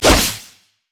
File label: electrical surge attack; LoL; kennen